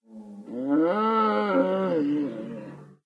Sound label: Sfx - Bull Panic